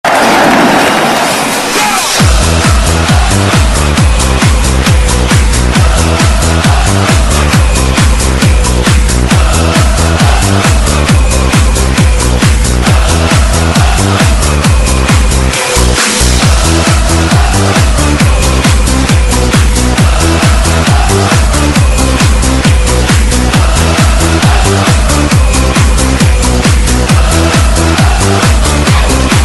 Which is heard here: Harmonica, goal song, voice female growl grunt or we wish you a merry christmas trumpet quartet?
goal song